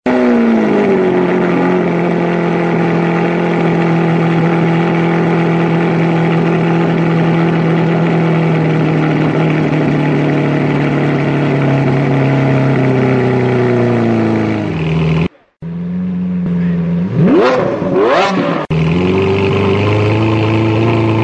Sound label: lamborghini veneno